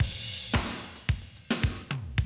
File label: 110, Classic Drum Loops